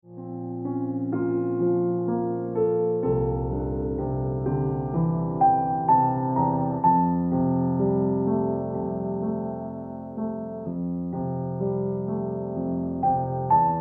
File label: Ringtone Piano Music